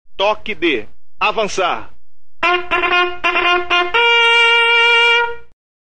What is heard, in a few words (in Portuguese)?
avançar, toques de corneta